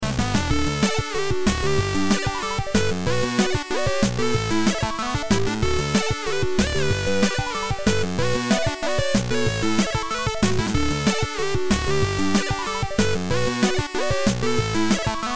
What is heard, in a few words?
chiptunes